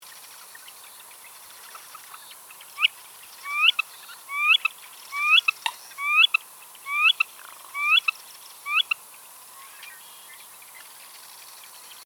Svasso piccolo

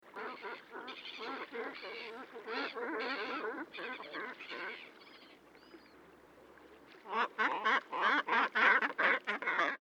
Cormorano

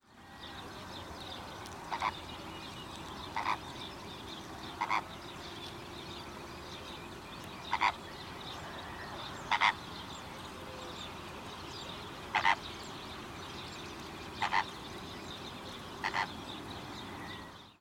Fenicottero rosa

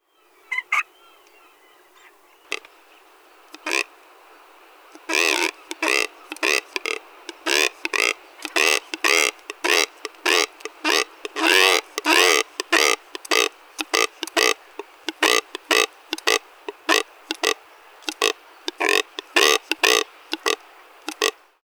Marangone Dal Ciuffo

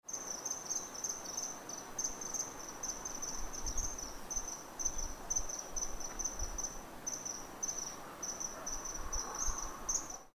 martin pescatore

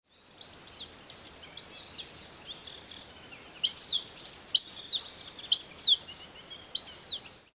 Falco di palude

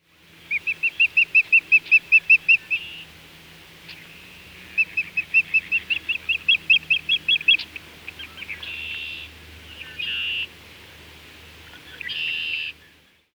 falco pescatore

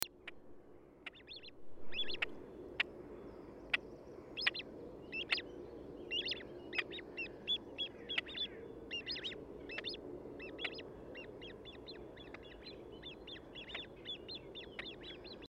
Svasso maggiore

